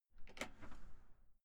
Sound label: entrance door open short outside